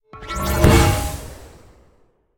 manhole pop; smite; osiris

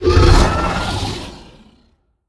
activate; smite; god tiamat

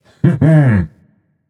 heh heh; smite; osiris

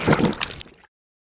Barf; smite; god bakasura

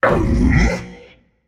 confused, voicepack; smite; jorm

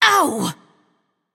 ouch; daji

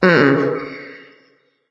disagree light; smite; voicepack; hel

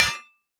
minion battle foley metal hit; smite; npc; roman archer

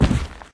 foot step; smite; zhongkui

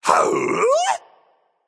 confused, voicepack; smite; jorm

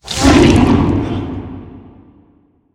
activate; smite; god scylla

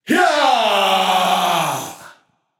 battle cry; camazotz

